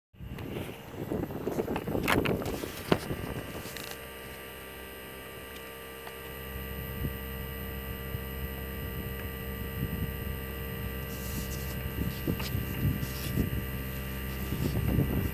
arret clim, climatiseur Mitsubishi MXZ-4 F72VA; Problème puissance clim

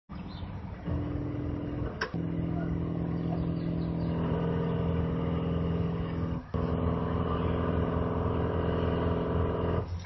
bruit daikin 2mxs40h, Bruit au démarrage unité extérieure Daikin 2MXS40H; Problème bruit au démarrage unité extérieure

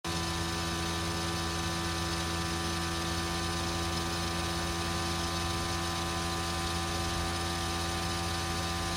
bruit compresseur, pompe à chaleur Fujitsu General; Fujitsu General modèle RO-36LE; PAC Air Eau; Conseils dépannage pompe à chaleur Fujitsu General modèle RO-36LE; PAC Air Eau Fujitsu General en panne

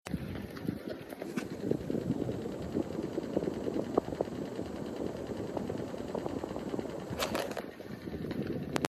split chambre ftxm25, climatisation; climatiseur Daikin perfera; Problème bruit climatiseur Daikin perfera

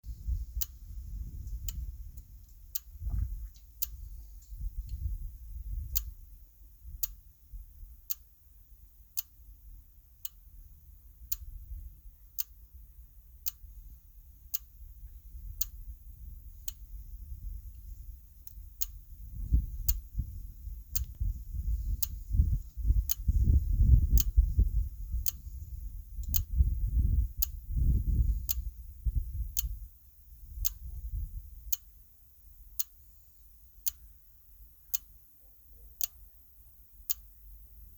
climatiseur mitsubishi; voyant power UI qui clignote

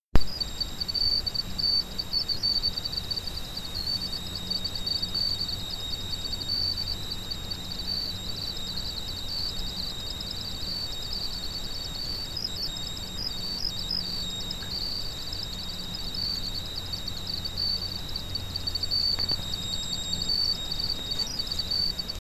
sifflement carte electronique amplifie, climatisation; Problème sifflement électrique UI hors marche

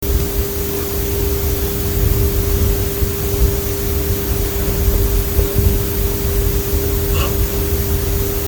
bruit alim, climatisation

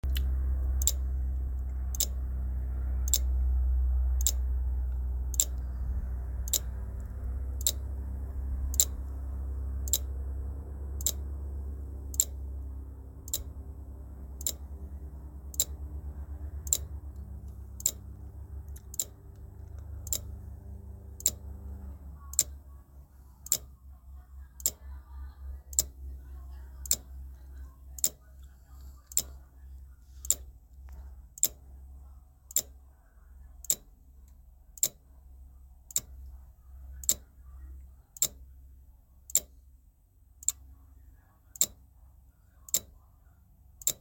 audio climatiseur mitsubishi; voyant power UI qui clignote

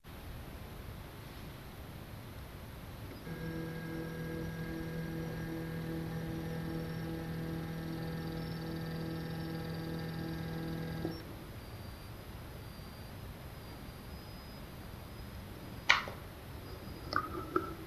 enregistrement amplifie, climatisation

